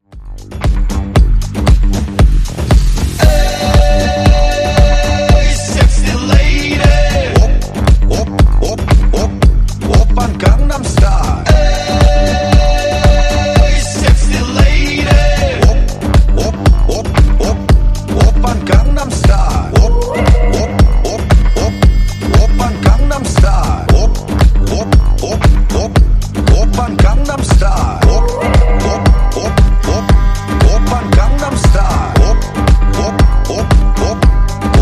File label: hype afro